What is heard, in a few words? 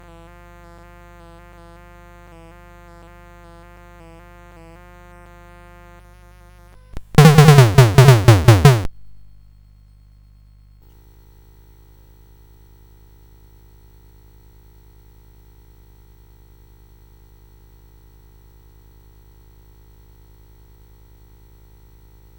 Drums, CSG8580R5; SoundEffects